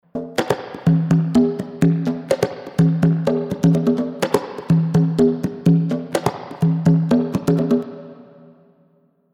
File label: Reverb Spring